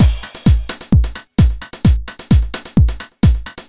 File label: Drum Loop 130; Audacity; loops